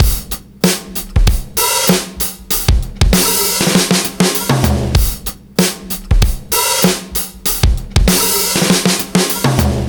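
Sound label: drum, 097bpm, Breakbeat; Toe Jam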